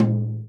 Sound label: tom, Real Drum Kit, jungle kit